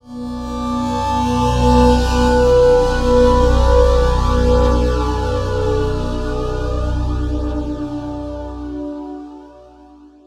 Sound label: synth evolving pad texture transition; BluezoneCorp; Better World - Ethereal Ambient Samples